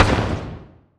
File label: asteroid damage carbonic medium; Factorio